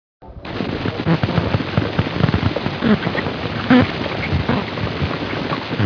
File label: longirostris; HAWAII; DOSITS